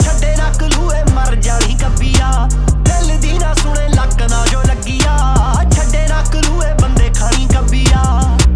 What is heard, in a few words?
Punjabi; Dolby